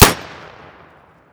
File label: k3 fire; armenian; vj weapons